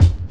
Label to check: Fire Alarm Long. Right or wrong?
Wrong